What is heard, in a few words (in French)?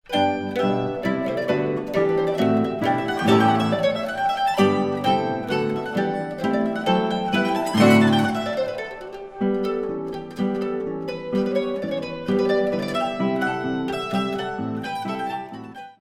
Quator en Sol Majeur, estudiantina; Hermann Ambrosius